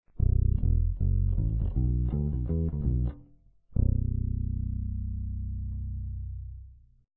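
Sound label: neck pickup finger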